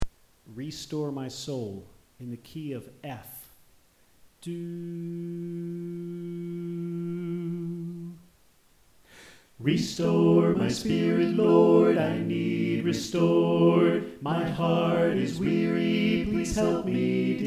Alto Left

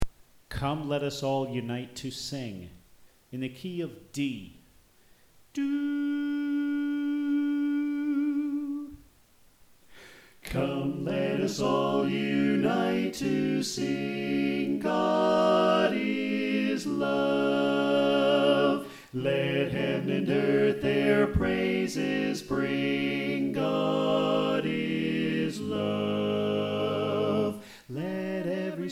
Soprano Left

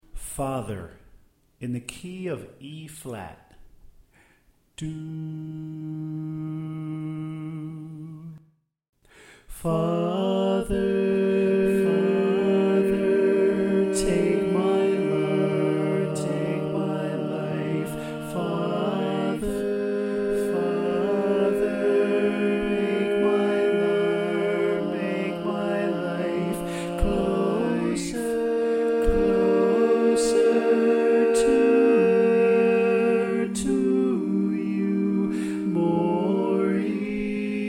Soprano Left

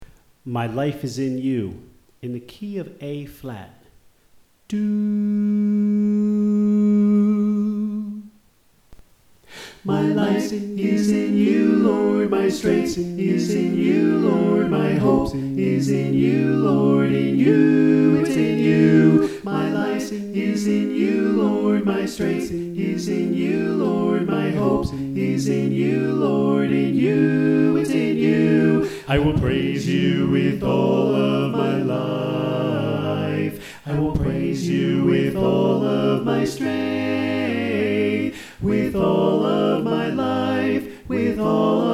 Bass Left

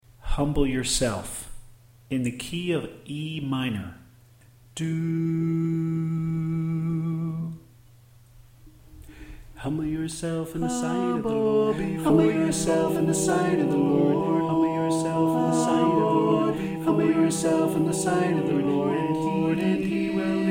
Soprano Left

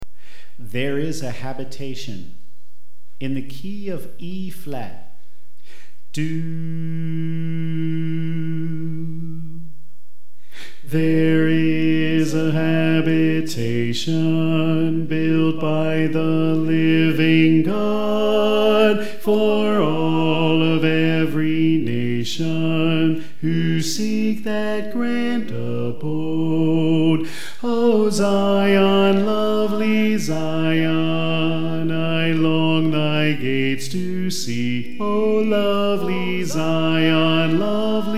Bass

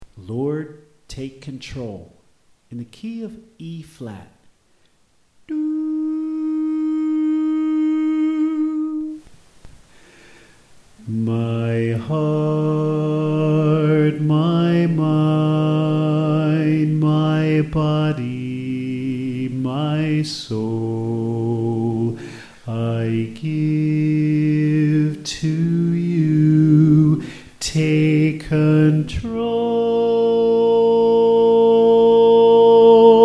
Soprano

